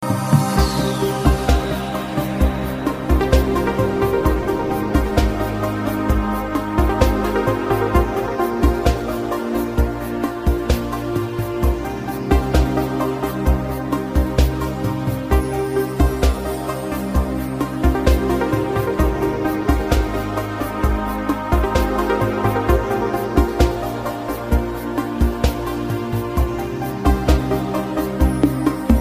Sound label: Beautiful Beat